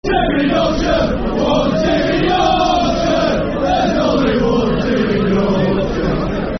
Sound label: Football Chant; One Team In Yorkshire; Huddersfield Town Htfc